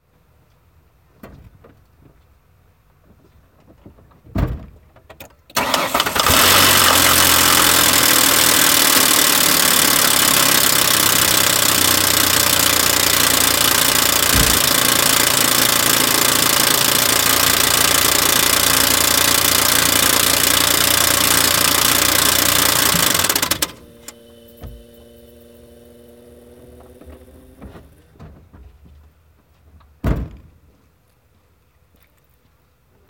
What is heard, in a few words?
valve cover knock, sounds like rat fan blades; 4.0l OHV SOHC V6; valve cover knock when got home now sounds like rat fan blades